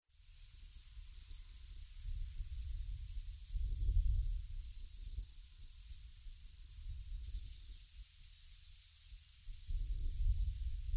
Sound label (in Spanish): Colibri de Arica Eulidia yarrellii